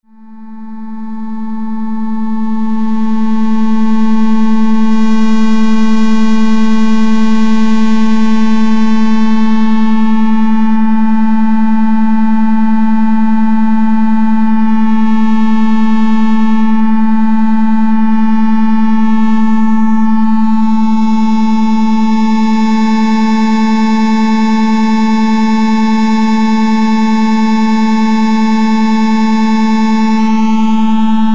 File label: NOISE